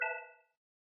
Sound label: hover